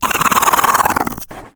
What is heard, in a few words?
Grounder Pain Heavy; Evolve; Kraken